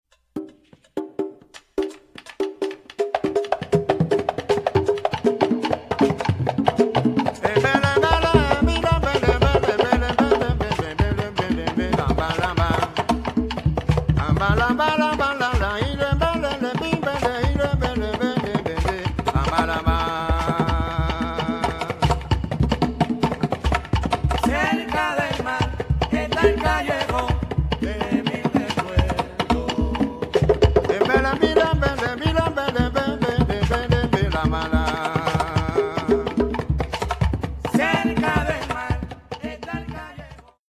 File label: rumba